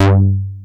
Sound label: SYNTHBASS, G2